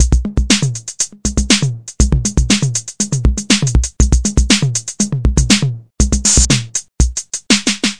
electro triplet finish, drum loops and breaks, 120 bpm